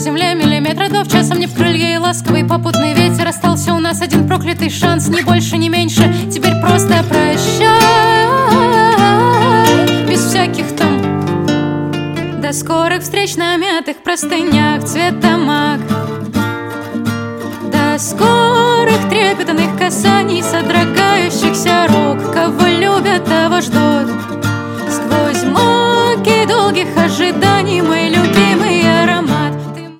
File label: acoustic version